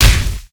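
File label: punch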